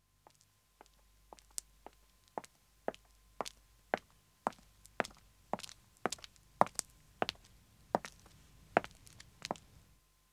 Pavement Walking 1 woman appr, Footsteps; The BBC Sound Effects Library

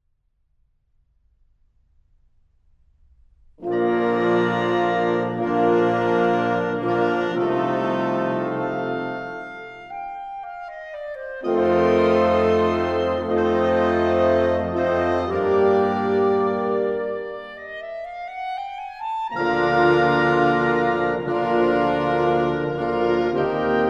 Serenade in Bb, Largo; Gran Partita; Mozart; Serenades and Divertimenti for Wind

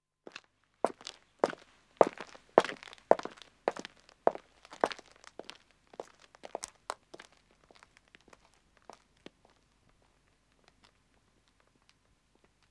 Country Road Walking 2 men de, Footsteps; The BBC Sound Effects Library

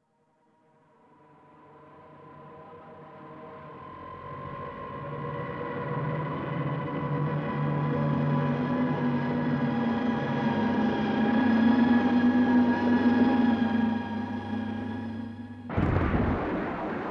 Light Aircraft, Dive And Crash; Emergency; BBC Sound Effects Library

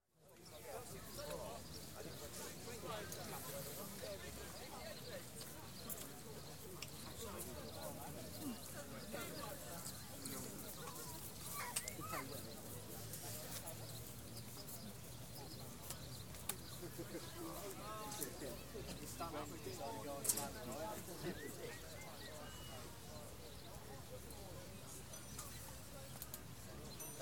Sports Crowds - County Cricket Match; Rec. At Clarence Park St. Albans, Herts Vs. Somerset

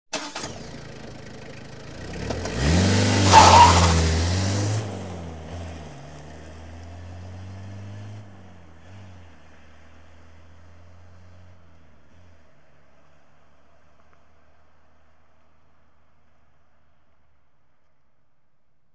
ext switch on, fast depart with squeal, Cars; BBC Sound Effects Library

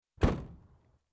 Fire Engine, Door Slammed; Emergency; BBC Sound Effects Library

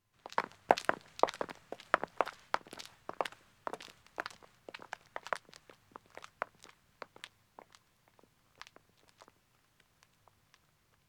Country Road Walking 1 man &, Footsteps; The BBC Sound Effects Library

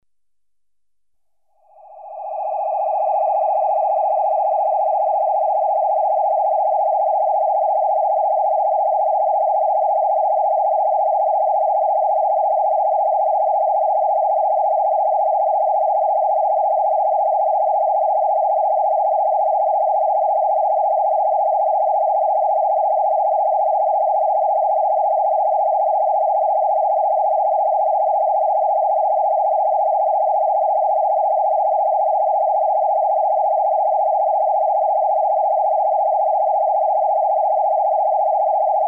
Warble, Electronically Generated Sounds; Sound Effects Library

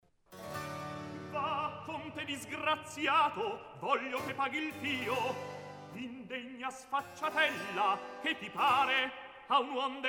Recitativo; Mozart; La finta giardiniera; Va Conte disgraziato

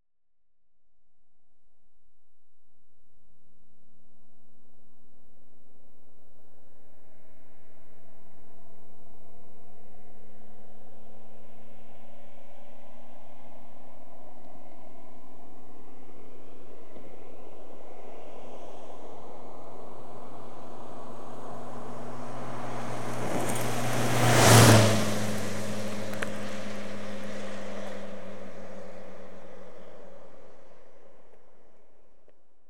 ext fast pass, Cars; BBC Sound Effects Library

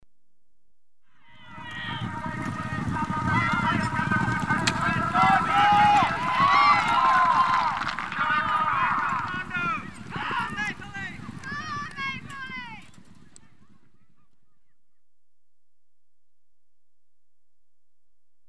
Polo - goal scored in match; Equestrian Events; BBC Sound Effects Library

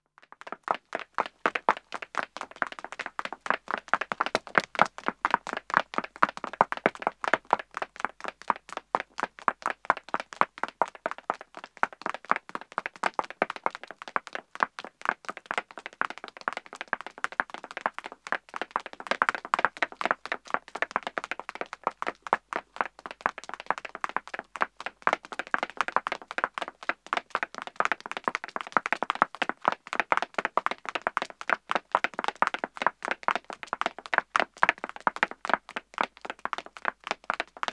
Pavement Running 2 women star, Footsteps; The BBC Sound Effects Library

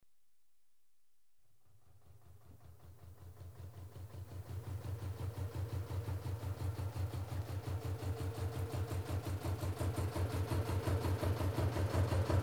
approach and stop, Ships & Boats; The BBC Sound Effects Library

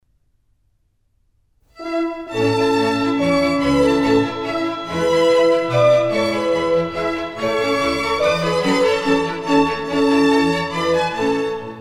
Minuets, A major; Mozart; Dances and Marches

